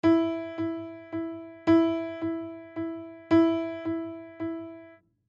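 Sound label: Three quarters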